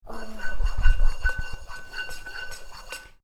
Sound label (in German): Pfannen reiben